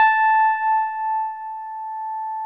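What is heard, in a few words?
electric piano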